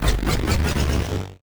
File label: Powerup Crank Generator Trigger